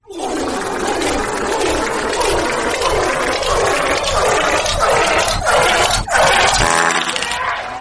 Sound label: le fart